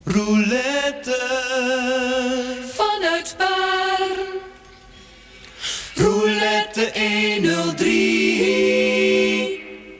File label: silence